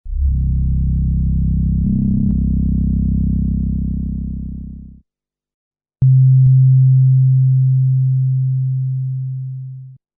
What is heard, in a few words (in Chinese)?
低音; At Home Alone Final Online; windows